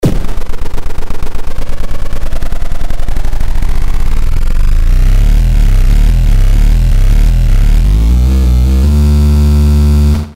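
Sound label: car engine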